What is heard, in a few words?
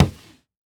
Foley Footstep Cowboy Boots Skid On Hollow Wood Surface; Foley Footsteps